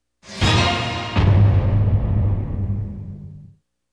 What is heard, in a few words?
classic intro; Dairantou Smash Brothers DX